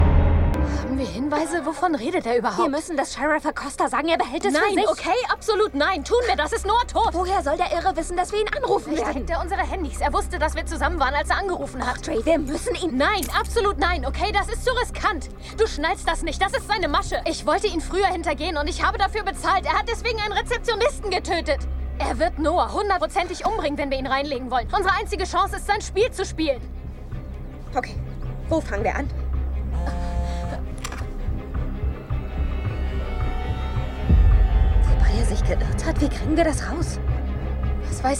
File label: scream